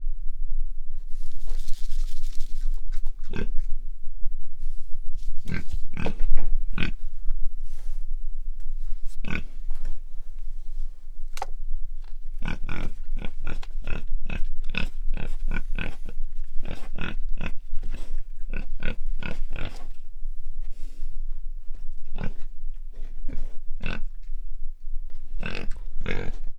röffentések, házisertés; Egerturistaház

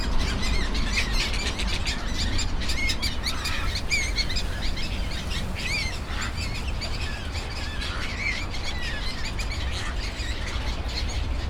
pásztorgém, Arrecife; allathangok

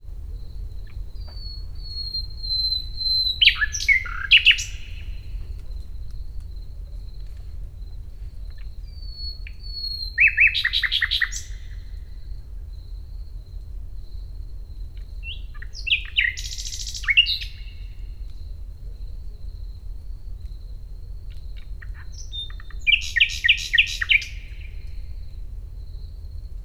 fülemüle; termeszetben

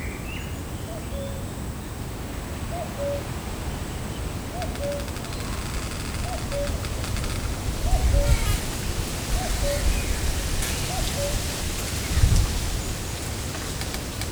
nyikorgó faág is, kakukk; szegedi zoo 2011

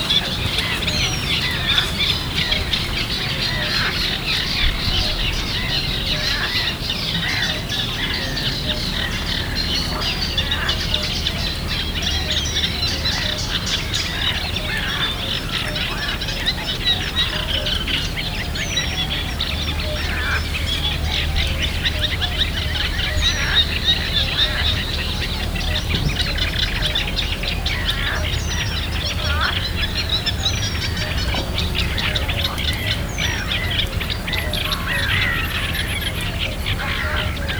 elhaladó kisvasút után külső végállomás közelében, hortobágyi halastavak

